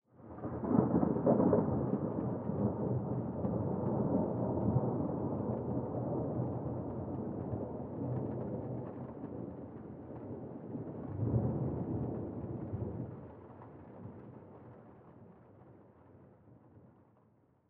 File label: thunder